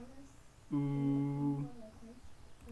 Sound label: ooooh